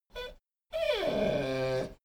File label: dogo argentino whine medium; natur-tiere; hunde